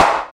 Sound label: clap sample E key; percussion; single hits one shots